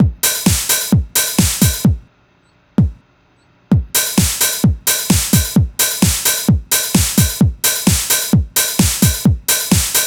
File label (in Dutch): muziek film